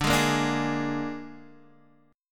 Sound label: Suspended 2nd Flat 5th; Gsharp